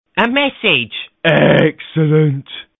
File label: a message soft music tone ringtone, sms ringtones